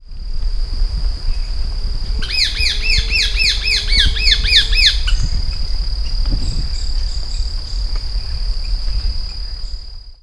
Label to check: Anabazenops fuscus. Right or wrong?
Right